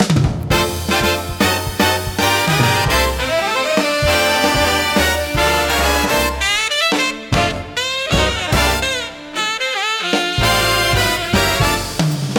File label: Brass Checkpoint, big band swing gorgeous showtime